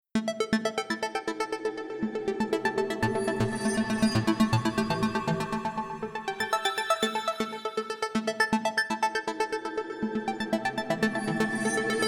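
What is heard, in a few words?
Synth Sequence Arpeggio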